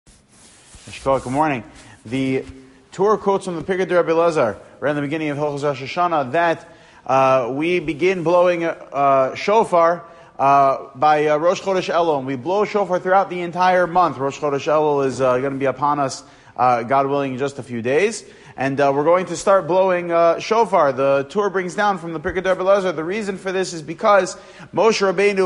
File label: blowing shofar; in elul; quick torah from la jolla